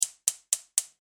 Household Stove Gas Spark No Ignition Oven, kitchen